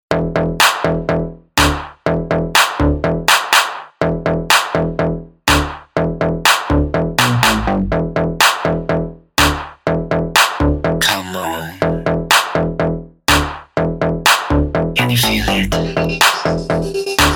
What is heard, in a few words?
singaporean music ringtone